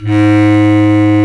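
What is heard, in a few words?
bass clarinet, Orchestral Winds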